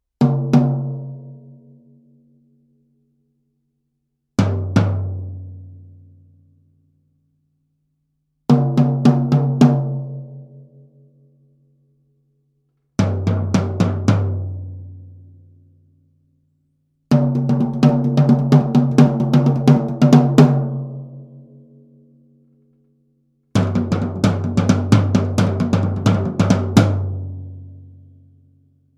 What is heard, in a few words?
Toms EAD Only, 12-inch rack tom and a 14-inch floor tom; EAD10 only; No damping on the Remo Emperor heads; Yamaha EAD10